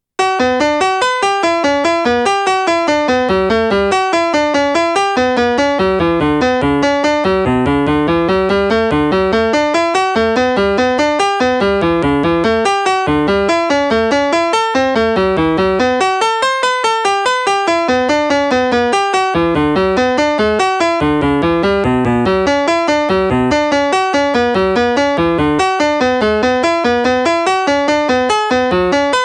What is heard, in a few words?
bach partita I double piano